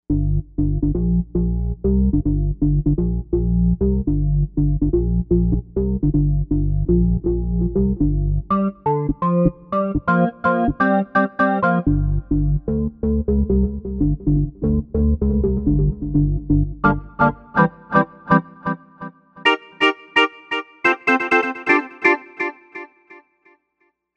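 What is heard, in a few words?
House Organ Bass, Novation UltraNova; Factory Patches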